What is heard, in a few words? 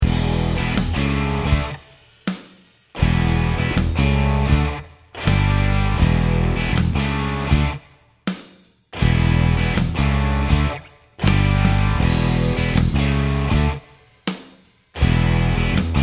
Hard Rock in E Backing; Minor Pentatonic Scale